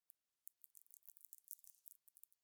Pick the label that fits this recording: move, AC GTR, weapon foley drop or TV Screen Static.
TV Screen Static